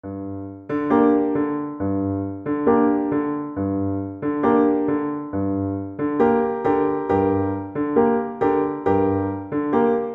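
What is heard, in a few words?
viola karaoke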